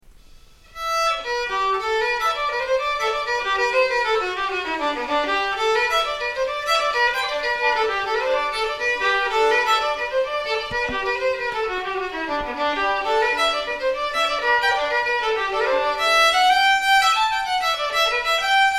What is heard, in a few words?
jigs